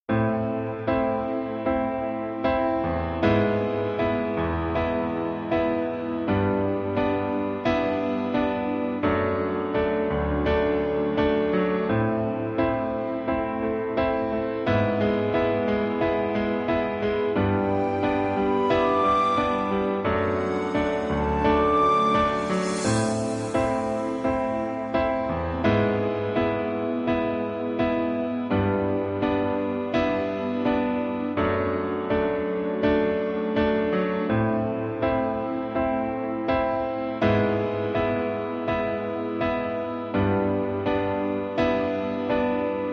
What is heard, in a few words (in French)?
accompagnement